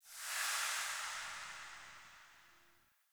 Shrine Activate; Objects

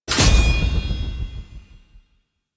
UI Defense Reward Stay; Warframe

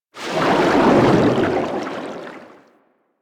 water movement by; Warframe; Vehicles; Sharkwing

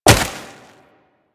Wall Jump Snow

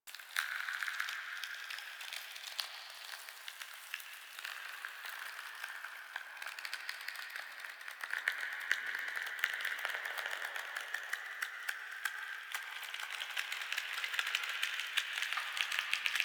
Lair Chatter Loop Large; Warframe; Ambience; Sentient

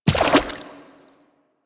Infested Medium Land Water, footsteps; Warframe

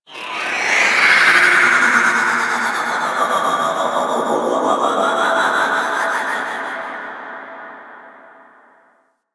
Ambient Whispers; Ambience; Warframe; The War Within; Golden Maw

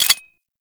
Fire Stop Mech; Weapons; Gotva Prime; Warframe

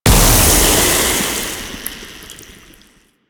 Toxic Ground Blast; Warframe; Infested; Ancient

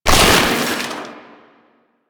Debris Fabric; Warframe; Ambience; Entrati

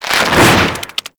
Infested Devourer Movement Whoosh; Warframe

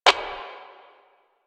Static; Warframe; Lasrian; Lasrian Enemy A

